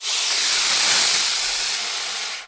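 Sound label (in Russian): зловещее змеиное шипение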